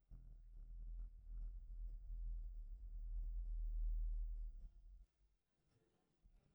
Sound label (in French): un nuage passe bass; Stems